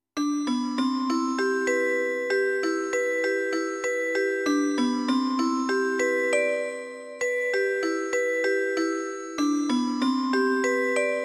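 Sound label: Loud Glocken, digital piano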